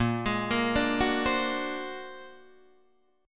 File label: Bb Augmented 9th Bb, Guitar Open D